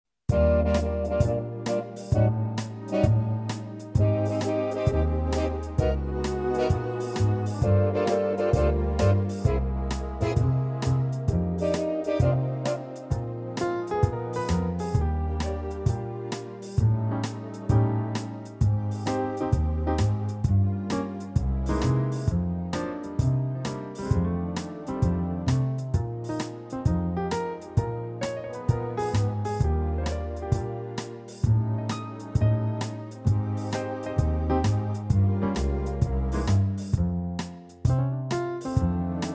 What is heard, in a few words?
Eb Live trak